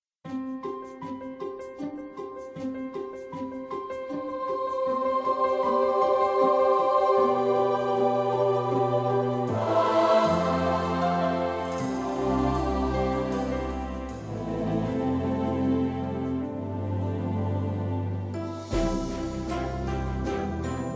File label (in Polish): Jezuniu malenki niesiemy Ci prezenty wersja instrumentalna